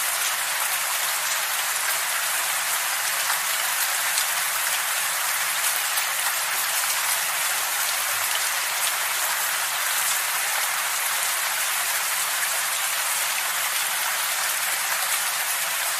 streaming rain

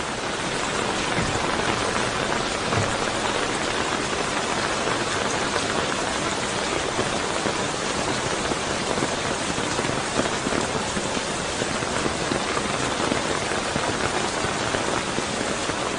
rain on tent

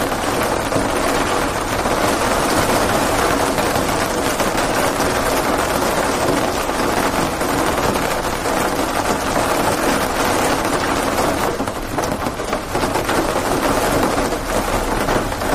rain on raincoat

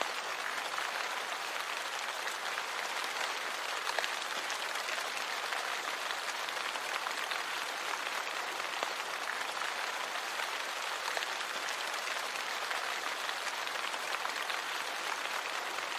rainy day, rain

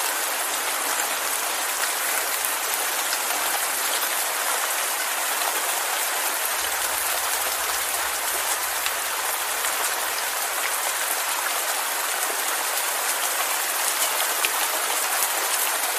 bright rain

